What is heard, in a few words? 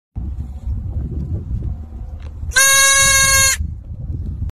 Goats baby sound; صدای بز